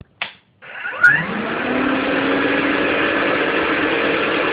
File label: vacuum